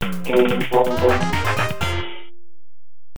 Game Over; Shoot Down

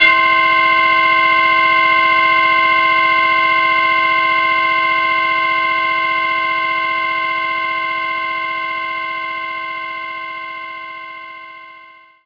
tubular bell C5

